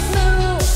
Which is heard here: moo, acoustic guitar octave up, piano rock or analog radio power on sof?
moo